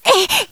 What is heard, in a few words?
hit; hoshino